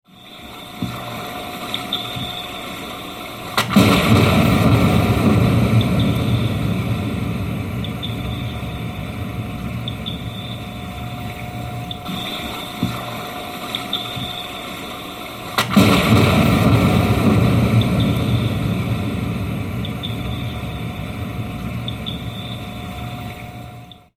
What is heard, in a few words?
seismic airgun